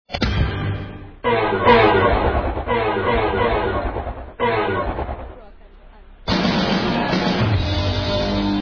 灭灯; LockChime; 音乐; 非诚勿扰

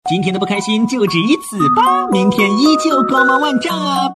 治愈; LockChime; 海绵宝宝; Tesla x 极氪 x More

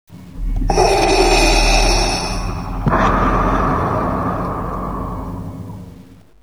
黑武士呼吸; LockChime; 星战; Tesla x 极氪 x More

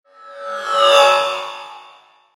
宇宙声音; LockChime; 特效音

